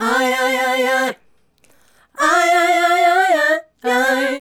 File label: Female Group, Hip-Hop and RnB Vocals